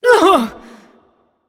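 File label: grunt hit, female; smite; voicepack; hera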